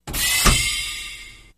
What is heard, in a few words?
Mechanical Door, pneumatic